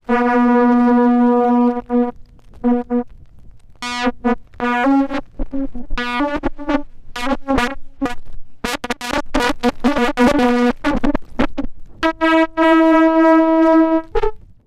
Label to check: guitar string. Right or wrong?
Right